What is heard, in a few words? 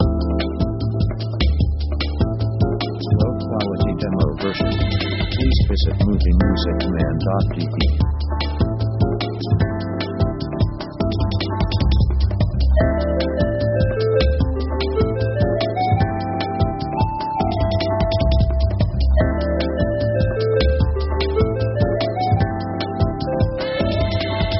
jungle flowing